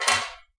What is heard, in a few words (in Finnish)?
outo, Clangy Crunchy Foundsound Kit